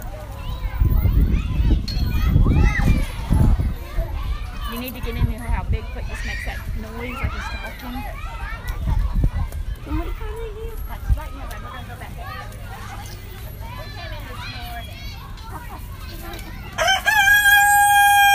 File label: Rooster